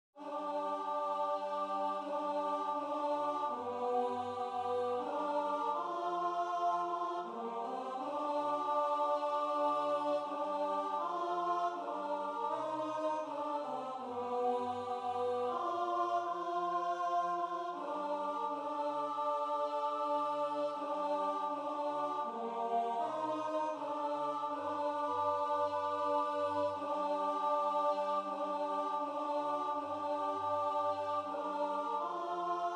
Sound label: tenor; Coventry Carol